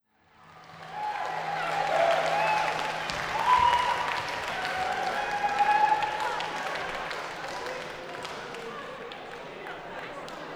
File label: Ceilidh Noise; ambisonic